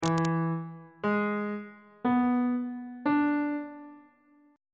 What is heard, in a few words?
d double sharp dominant 7th chord intervals; bass clef